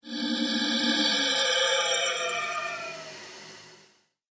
Ghost Strange Breath; Ghost Sound Effects; horror

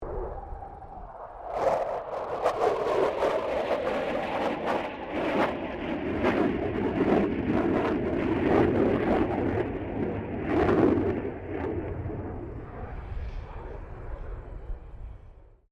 AIRCRAFT F16 FALCON PASS; Airplanes; Vehicles

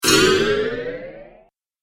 Boing Magic Jump; Magic Sound Effects; Role Playing Game; Andrew Fleming

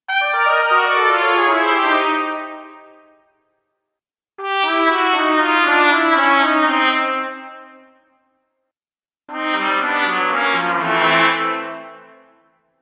Cartoon Failure Trumpet Collection

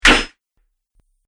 Scimitar Dents Armor, sword; combat

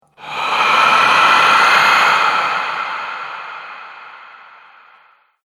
Ghost Breath, scary breathing

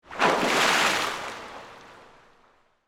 Splash Surface, Indoor, Pool; Water; Splashes (Sound Effects:Objects); BlastWave

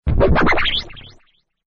take off, Spacecraft

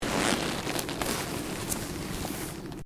LEATHER MOVEMENT

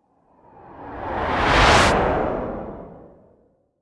Spaceship Whoosh; Science, Fiction; Spacecrafts

